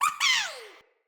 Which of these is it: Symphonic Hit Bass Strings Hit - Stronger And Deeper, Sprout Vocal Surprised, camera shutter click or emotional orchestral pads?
Sprout Vocal Surprised